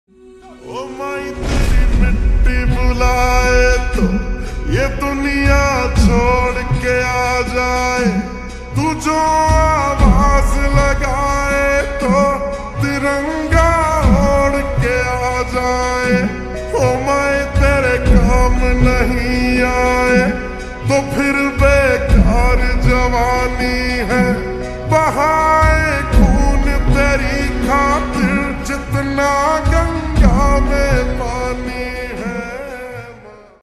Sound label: Slowed Reverb Ringtone